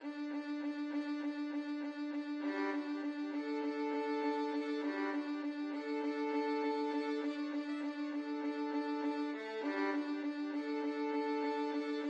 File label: violin duet